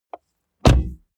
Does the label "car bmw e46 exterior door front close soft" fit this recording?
Yes